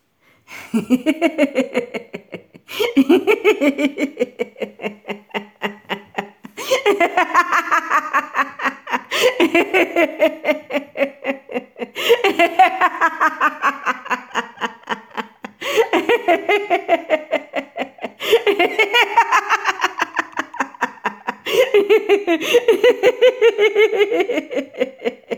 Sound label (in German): Klingelton lacht